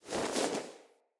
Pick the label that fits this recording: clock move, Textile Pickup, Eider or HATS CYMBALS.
Textile Pickup